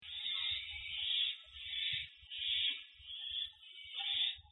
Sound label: chick hiss, owl